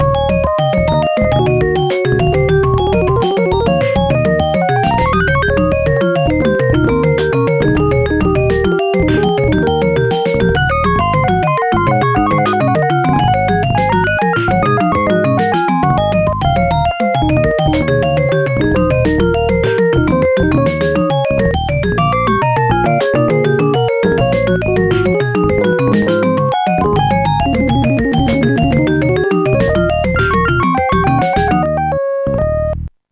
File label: jazz blues; Instant Music